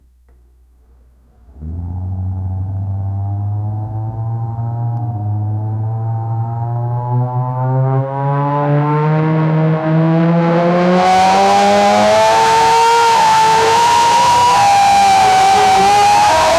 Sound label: 3.8l v10 twin turbo, race car